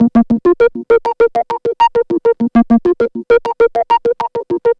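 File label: SYNTH, TIMBA, Hip-Hop & R&B; USB Soundscan vol.47; AKAI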